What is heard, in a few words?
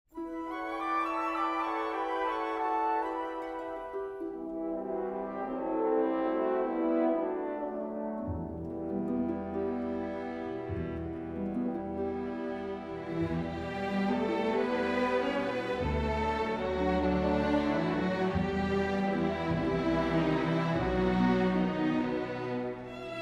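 Greensleeves (nepdal), Midi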